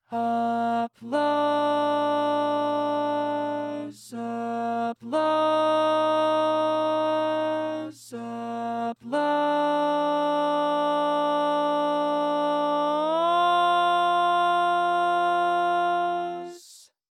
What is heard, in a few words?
Applause Applause Applause-Lead, barbershop tags